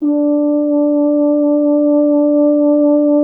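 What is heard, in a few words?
French horn; Miroslav Vitous; Solo Instruments VOL-2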